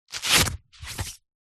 hospital blood pressure machine arm band undo remove velcro